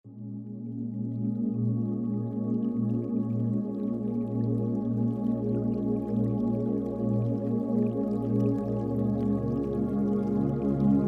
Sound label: 432HZ Meditation Music; Raise Your Vibration While You Sleep; DNA Repair Healing Meditation Music; Expand Your Consciousness